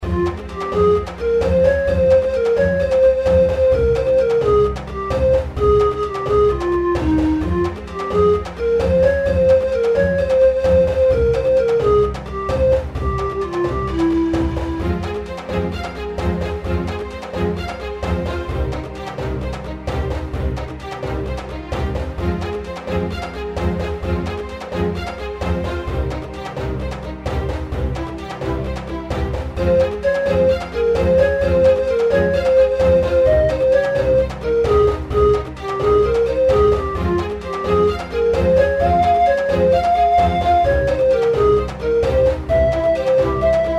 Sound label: reelspin fg; Lost Realm